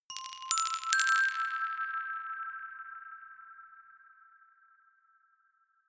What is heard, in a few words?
notification glockenspiel rattle triad up major